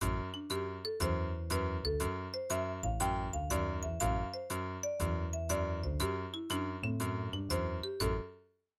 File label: MIDI Animation stings